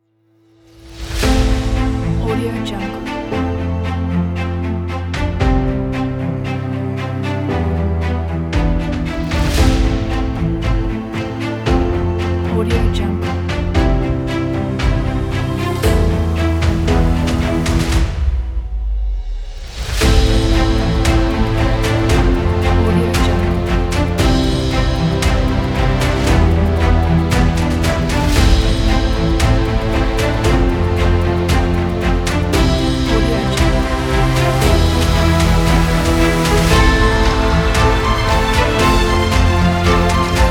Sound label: epic; soundbay